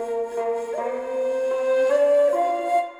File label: ethno, 160, C